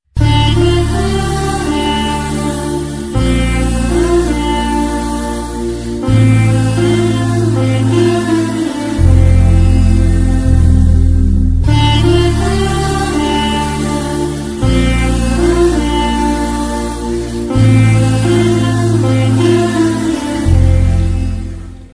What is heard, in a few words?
brothers sad bgm ringtone tamil bgm ringtones; brothers movie